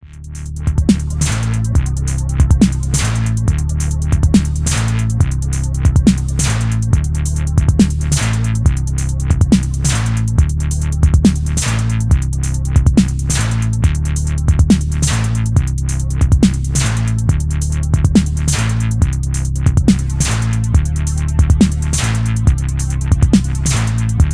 Roland SBX 1 LFO Teiler Sync

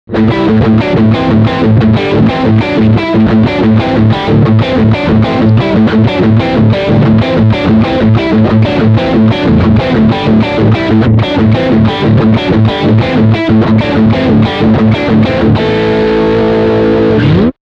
Riff beide Pickups

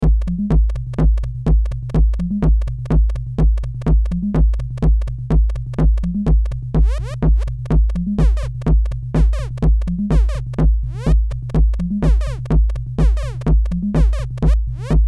Summit Audio EQP 200B Mix Bus ohne EQP 200B

